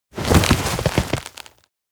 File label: hug more desperate; koyomi; anemoi パッケージ版 1.1